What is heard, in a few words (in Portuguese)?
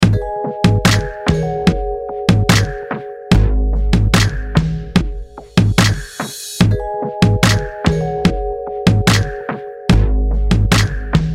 interludio